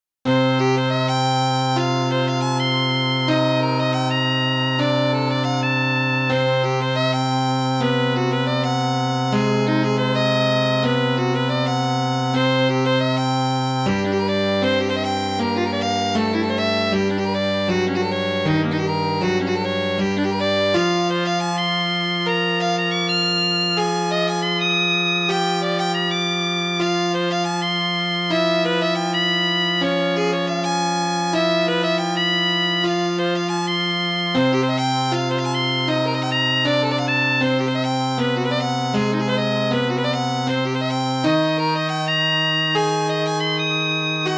String trio harmonic minor and septimal minor with honky tonk piano